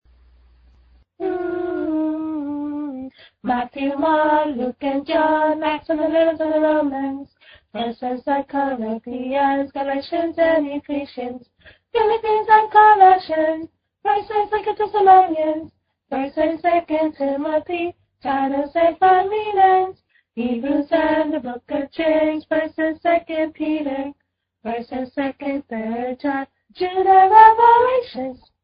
Books Of New Testament Song

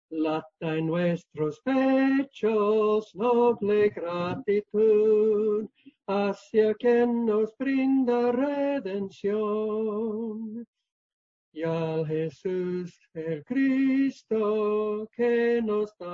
Ring The Bells Of Heaven (Spanish)

